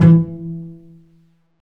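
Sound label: STRINGS, Cbs Pizz; Roland L-CD702; Cbs FX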